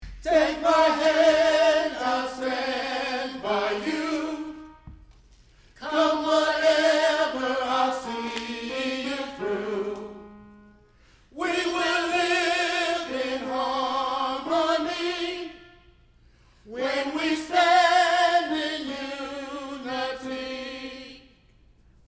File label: tenor